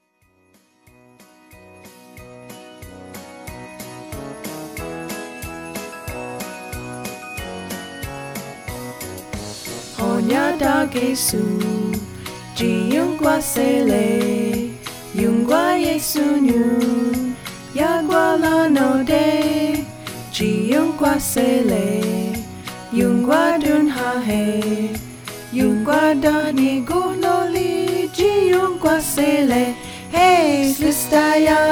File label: jingle bells